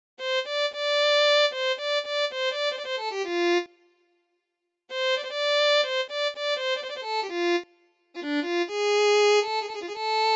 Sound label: Violin